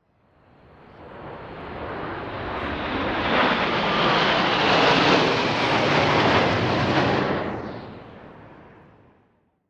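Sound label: Plane Red Arrows overhead Jet F; hawk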